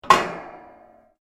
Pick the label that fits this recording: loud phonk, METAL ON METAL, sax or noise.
METAL ON METAL